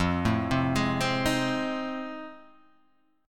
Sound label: F 7th Sharp 9th F; x,x,3,2,0,3,2,0